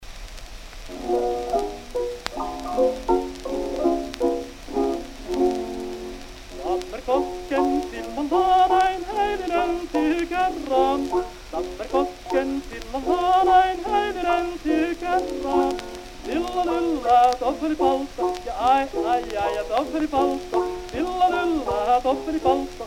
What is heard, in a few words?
Gramophone